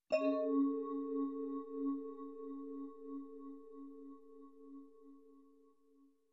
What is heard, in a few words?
Suspenseful vibraphone sound effect